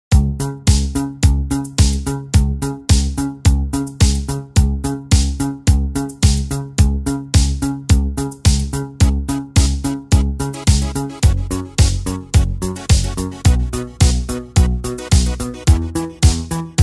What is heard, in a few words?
keyboard italo disco mix music, korg pa4x; martina style music